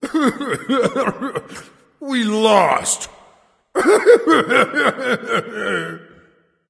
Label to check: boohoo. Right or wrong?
Right